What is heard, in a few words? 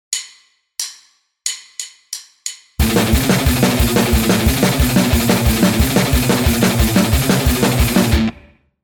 Riff, guitar